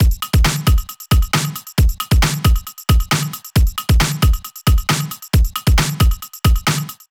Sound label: 135 DRUMLOOP; Loopmasters; Booty Breaks Vol.2